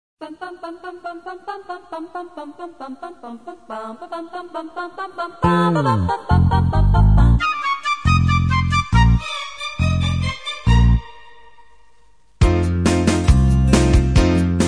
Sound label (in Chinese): 次中音sax伴奏曲